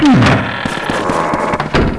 Opening A door; GAMECUBE; LUIGISMANSION

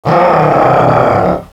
ahhh; DS; ML BOWSERS INSIDE

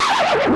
cpu spin; SNES; SMK

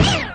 throw Egg; yoshi; N64; YOSHISISLAND

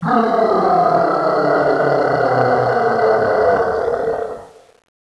growing giant; Bowser; DS; ML BOWSERSINSIDE

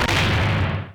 got hit; SNES; SMK

